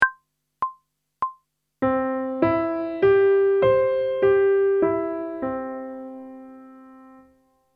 Major Arpeggio one octave ascending and descending; pop vocals; grade 4; Technical Work